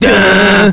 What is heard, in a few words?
ROCK G#; Beavis and Butt-head in Virtual Stupidity